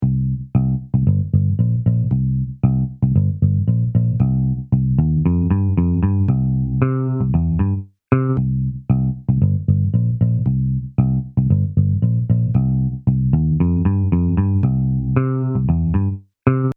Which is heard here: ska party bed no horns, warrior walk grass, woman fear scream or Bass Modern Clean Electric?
Bass Modern Clean Electric